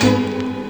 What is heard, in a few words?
HIT, Hits & Brass